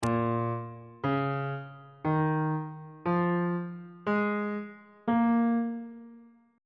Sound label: a sharp minor pentatonic scale